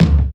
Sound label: present bass drum one shot d key; kick